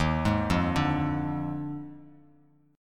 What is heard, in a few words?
Eb Major 7th Suspended 2nd Suspended 4th Eb Bass Standard; arpeggiated; 11,11,3,7; EbM7sus2sus4